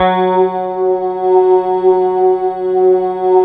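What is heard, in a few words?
synth Morpheus; Sound & Vision - Gigapack II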